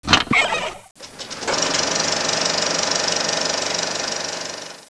chest

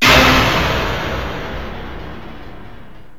ABYSS

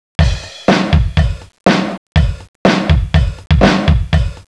POP

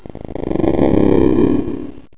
GROWL; JAGUAR

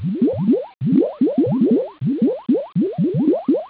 bubbles

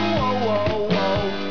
"Ow ow oh"

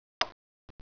click; AMBUSH

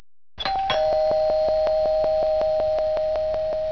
DOORBELL

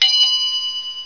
DING

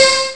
SYNTH

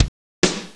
DRUMS

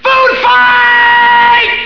ANIMAL

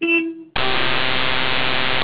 BEEP

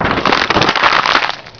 CRINKLE

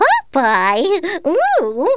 pop ewwww

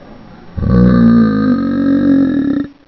bot walk; Gloom3 rejects

